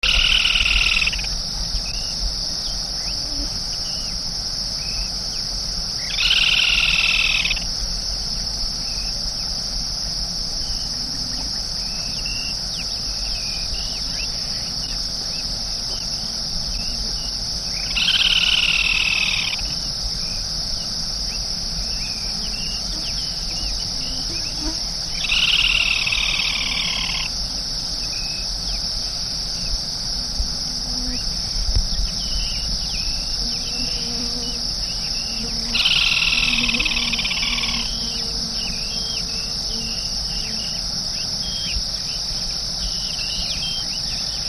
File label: Laterallus xenopterus